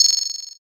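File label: bell; suzu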